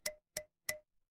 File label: glass glass tap